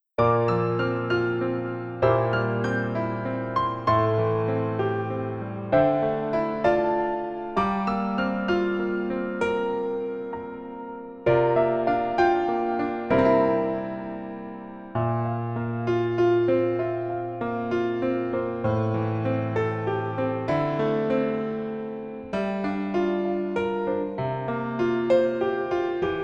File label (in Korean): Piano ver 멜로디MR 여자키